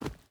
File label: footstep boots forest run; Rust